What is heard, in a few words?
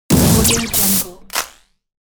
scifi weapon shot